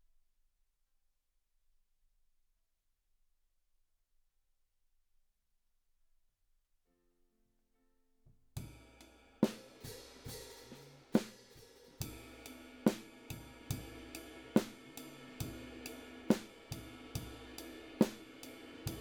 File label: Drums Para